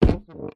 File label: foley footstep single boot ladies wood floorboard creak; zapsplat